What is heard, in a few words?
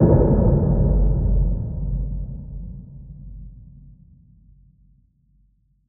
Deep Impact; Psytrance; FX